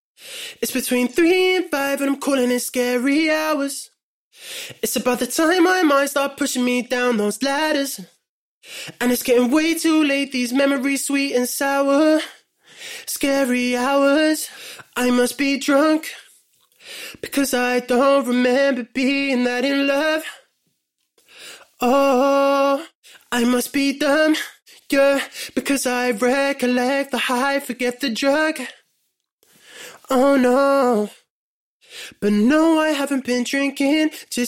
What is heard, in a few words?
pop 115 BM